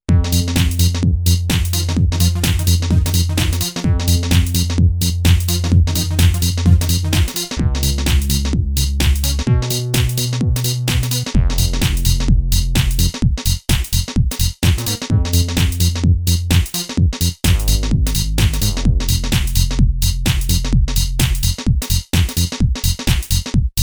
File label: Dry as Garage